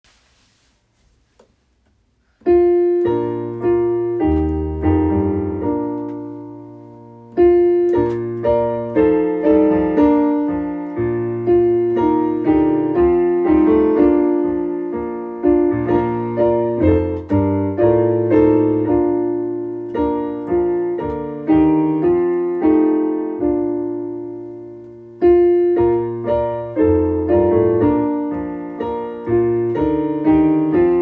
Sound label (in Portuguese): playback hino